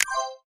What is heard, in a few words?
Pause Off